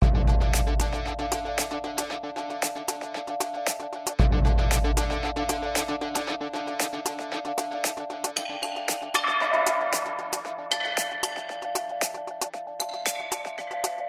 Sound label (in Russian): muzyka ojydaniya zapuska strima